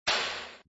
klik

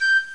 fluit g-4

